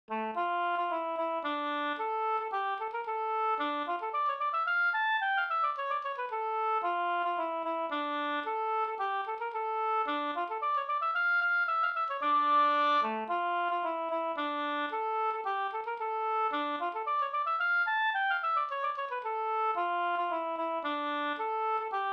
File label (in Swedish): polska d moll